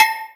Metal, Percussive FX; musicradar; cartoon caper

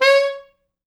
C#4, Pop Brass; Best Service ProSamples vol.19; AKAI

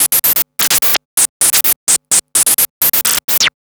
Scratch Fills 140BPM

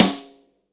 kit, Yamaha Motif-ES8

